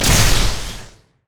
guardian spell attack; odin

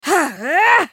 spell cast effort; Karma; Character Voices; English

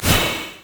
arc throw; Diana

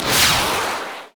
explosive shot; rocket tristana

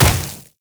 frost scorched earth; LoL; shyvana

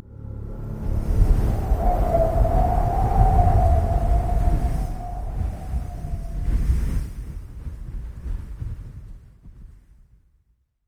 heavy wind cast

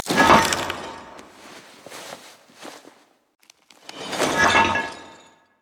emote laugh; LoL; thresh

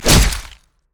basic attack knee; rengar

